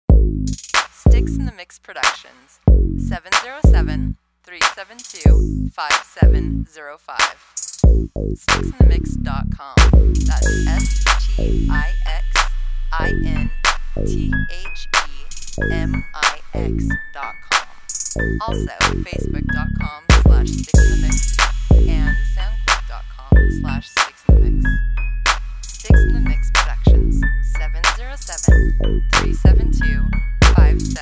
Piano

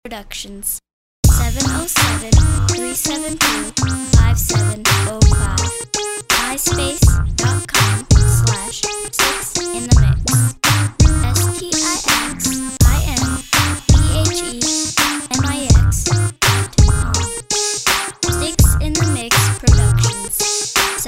slow bounce

